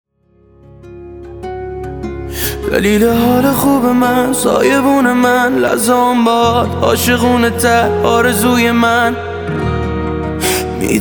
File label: Guitar Version